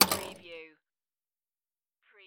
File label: Pinball sound, spring